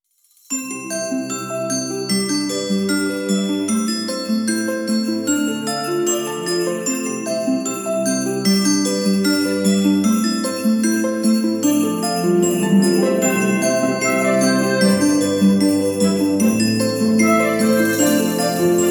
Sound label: Christmas Bells and Strings magical happy background; urbanniAudio